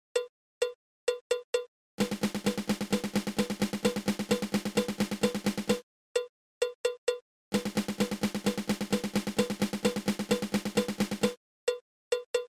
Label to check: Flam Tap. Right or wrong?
Right